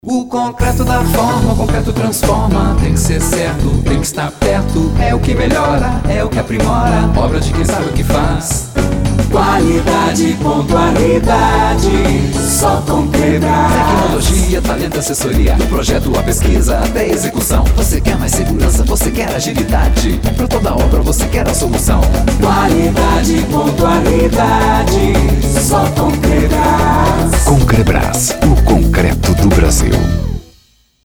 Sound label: Jingle